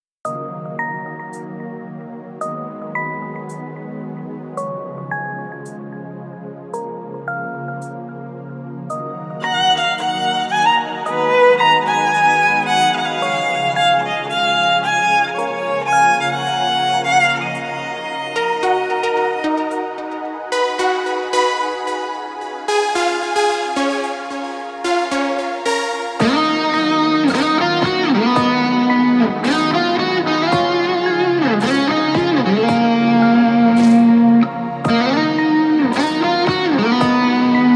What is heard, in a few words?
guitar violin